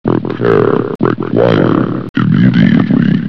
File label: pain, flamebot